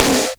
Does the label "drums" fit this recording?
Yes